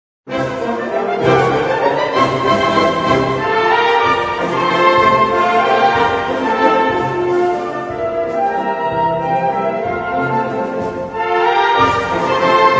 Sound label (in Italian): symphonic valzer